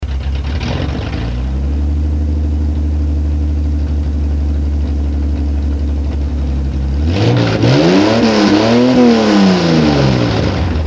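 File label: Ford F-350 Cammed Rev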